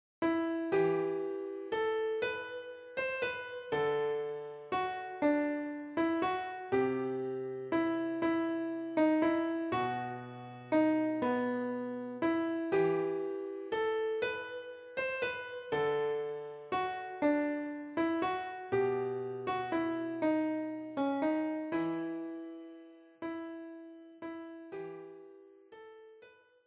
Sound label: What child is this Kl